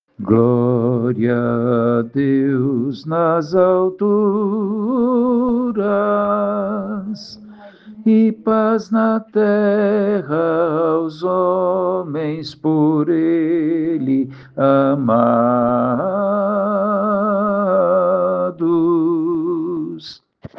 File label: gloria soprano; coral sao francisco; missa vigilia pascal